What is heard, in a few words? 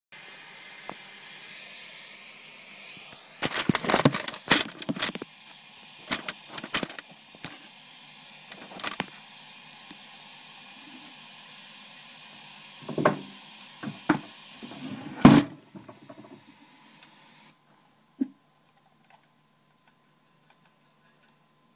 guitar cover